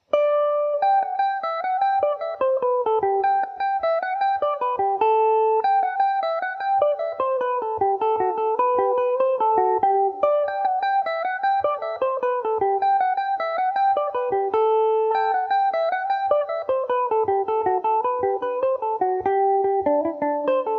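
jacksons welcome home G major revelation, mandolin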